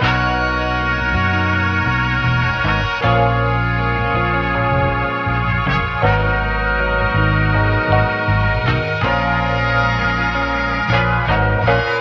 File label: horns, Loops